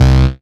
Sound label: Bass C, Offbeat Bass